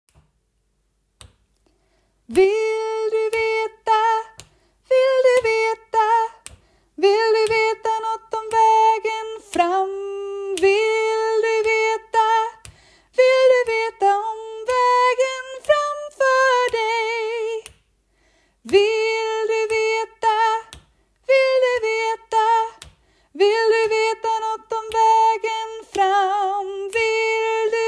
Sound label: tenor